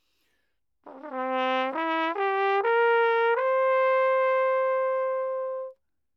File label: natural baroque trumpet, mid; OPENAIR